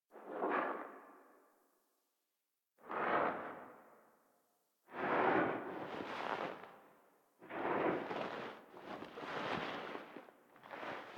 Foley Operator Crawl; Warframe; Orbiter Nursery

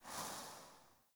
Word Upgrade Menu Exit Dissolve; UI; Word Upgrades

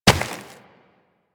Run Rock, Footsteps; DE; Warframe; Tenno

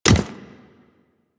Chimera Step Default; Warframe; Ballas Chimera; Steps

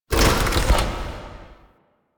UI Mod Activate Countermeasures; DE; Warframe; Railjack Player Ship

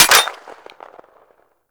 Gorgon Fire Mech Single, Weapons; Warframe

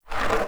Wood Stool Drag Scrape; Objects

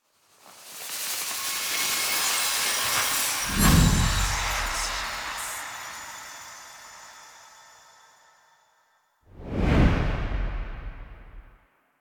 Quest Card Appear; Warframe; Jade Shadows; Quests

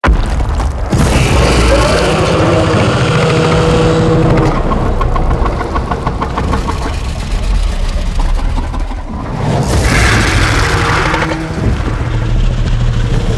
Infested Micro Planet Sun Monster Spawn Fire; Warframe

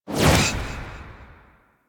Corpus Ship Void Portal End, ambience; Warframe; Corpus Ship Remaster

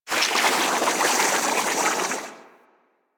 Venus Landscape Puffer Plants, Ambience; Props; Warframe; Venus Open World

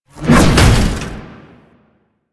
Necramech Ranged Spin Attack Swing; Enemies; Warframe

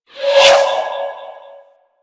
Spirit Primary Fire Whizzby D; Warframe; Grineer Thumper Bot; Sentient Spirits

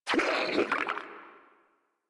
Frogman Pain, Vocals; Warframe; Grineer

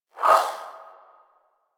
Swing, Melee; Weapons; Warframe; Modular Melee

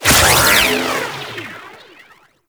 Marker A, UI; Parazon

